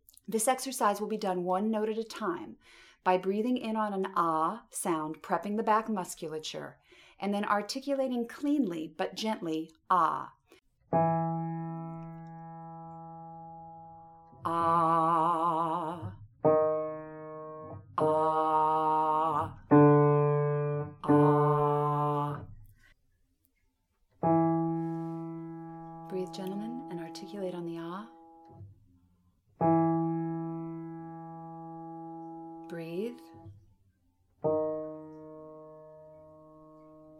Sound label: Bass Exercise; Warmup Exercises